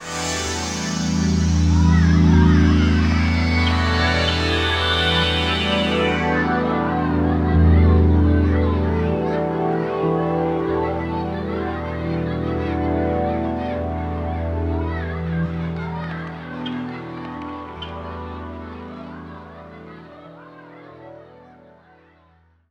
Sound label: Global Kids Pad - 9A; Jungle; Pads; Blu Mar Ten; Jungle - 1989 to 1999